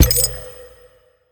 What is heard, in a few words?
ambient game special treasure touch unlock